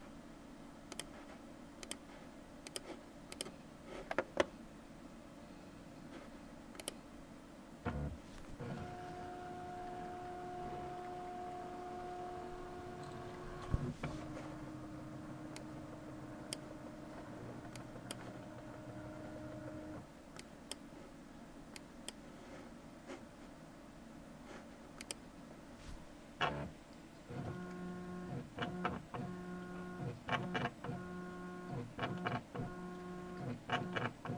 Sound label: scanning